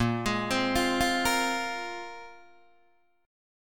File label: A sharp 7th Suspended 2nd down Guitar DADGAD